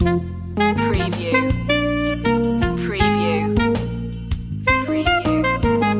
loop

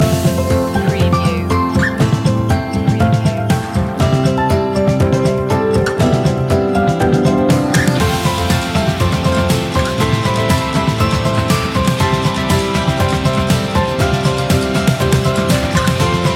a warm welcome no vocals

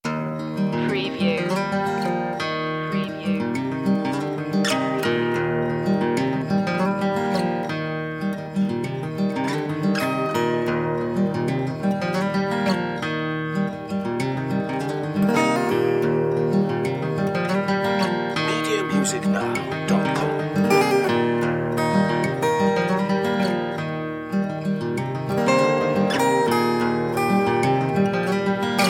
campfire moon

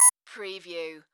Multimedia Beep

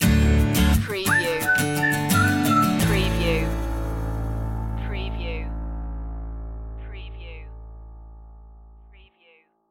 whistle acoustic stinger

